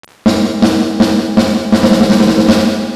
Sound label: SPRINGY CHIRP; XenoVerb